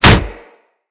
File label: Kick Hit, Melee Impacts; Weapons